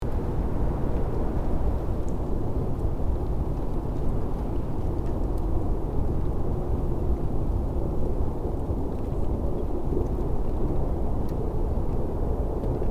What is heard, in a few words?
Sand dunes gentle wind ambience